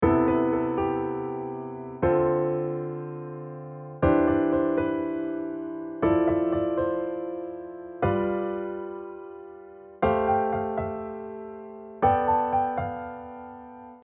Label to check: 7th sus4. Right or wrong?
Right